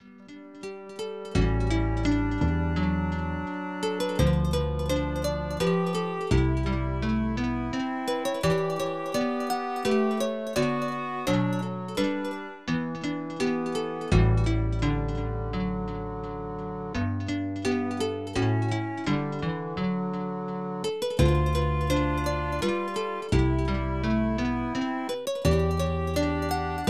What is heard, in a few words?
Caprice For Lute; Dai Koukai Jidai 2; Uncharted Waters New Horizons; SNES